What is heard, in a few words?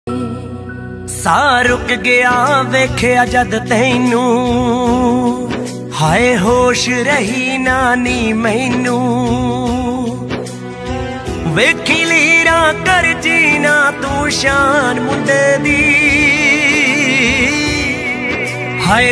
punjabi ringtone